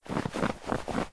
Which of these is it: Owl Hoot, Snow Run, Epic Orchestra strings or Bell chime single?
Snow Run